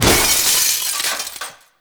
Glass Window Break; Warframe; Ambience; Nineteen Ninety Nine

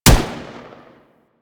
M16 Shot, rifle; Wraith

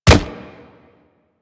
Step Default, Footsteps; Warframe; Infested Charger

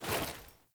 Foley Action Punch; Characters; Player

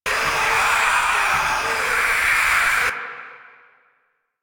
Execute Aim Deco Loop; Warframe; Warframes; Pax Duviricus

